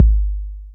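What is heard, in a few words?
bass drum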